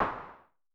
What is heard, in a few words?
SN Filtered, Vermona Mono15 Drums Snare